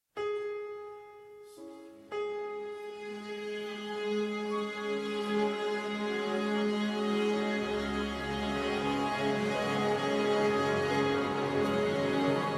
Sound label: Orchestra tuning